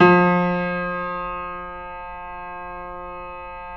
Keyboards Acoustic, F2; USB Soundscan vol.17; AKAI

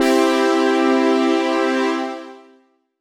Synth Pad Chord, Old Skool House; Big Fish Audio; OSH Chords

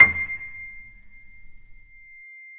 PNO MF; Synclavier Sampler Library

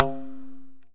tabla, Indian Percussion; Mango Loops

